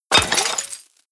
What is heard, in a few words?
debris pottery, bullet impact debris